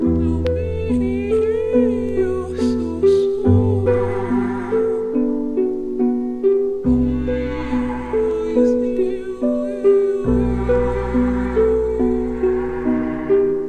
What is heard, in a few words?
keys, Sad